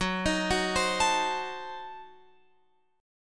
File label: F sharp Diminished 7th F sharp Guitar Open F; F#dim7 arpeggiated; x,13,15,13,15,16; chord shape